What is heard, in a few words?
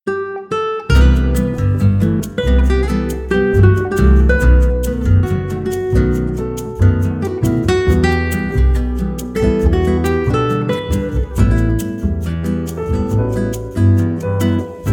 Nylon Guitar